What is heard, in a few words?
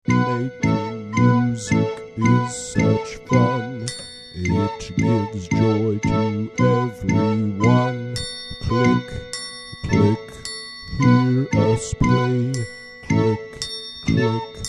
fun with music rhythm sticks